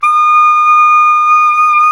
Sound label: SAX SOP SAX, Soprano Long; Roland LCDP07 Super Sax